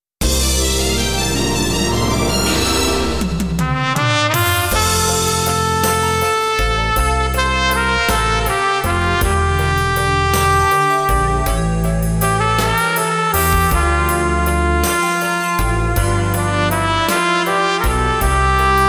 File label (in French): concerto de l ocean trompette